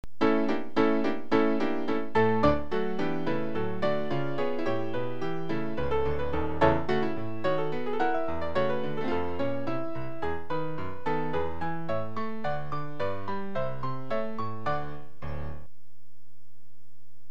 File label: Piano; How To Play the Piano Despite Years of Lessons